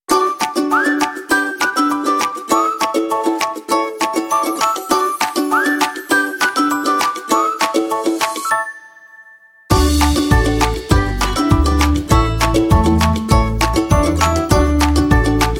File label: Upbeat Ukulele Fun Pop Kids